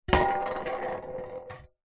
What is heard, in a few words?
foley tin metal cylinder lid on roll on concrete; zapsplat